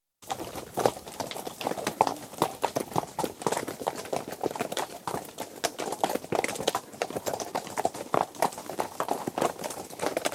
Group of horses on trek going over various surfaces, recorded from riders' perspective, occasional indistinct voices in background; Horse Sound Effects; BBC